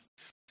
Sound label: Silence